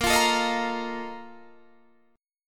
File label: A sharp Major 7th Suspended 4th